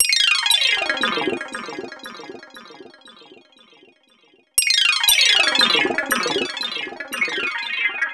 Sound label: Gliss 2fer Gliss, lounge, 118; Zero-G Kocktail Kollection; Construction Kitz (Lounge); Gliss 2Fers Mixes 118